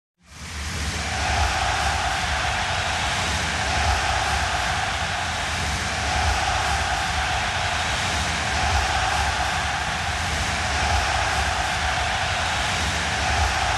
Crowd Rhythmic Yells And Rumble - Very Large Crowd Interior Stadium; Hollywood Edge; sound designer's toolkit v2